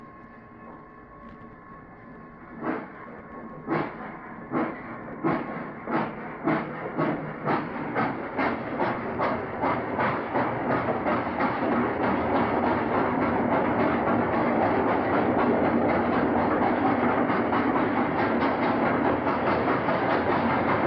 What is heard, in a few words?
E 6 460 whistle; Steam Sounds, Steel Rails